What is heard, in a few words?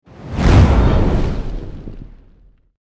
Wizard magic infernal flames; fantasy